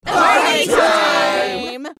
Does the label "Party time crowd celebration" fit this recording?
Yes